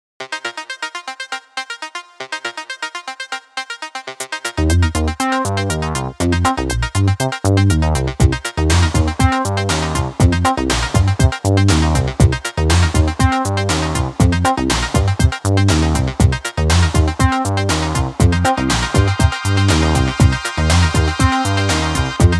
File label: 120BPM, synthesizer; daHornet-bis; synthesizer plugin